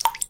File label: water drop